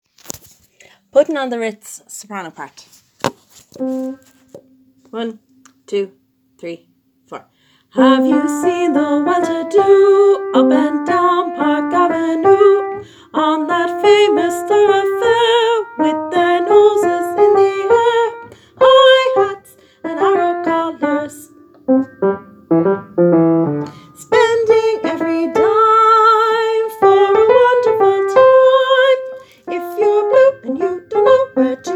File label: Soprano